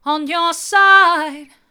hard, vocal

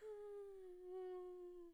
fear low; VIVAE

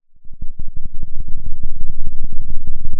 pop, Sound of a thunderstorm

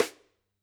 Sd St, drum

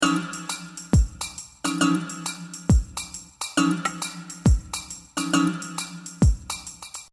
loop

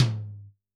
Tom, drum

